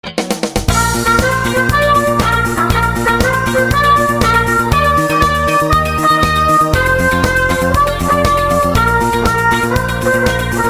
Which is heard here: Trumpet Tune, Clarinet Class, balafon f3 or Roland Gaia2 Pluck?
Clarinet Class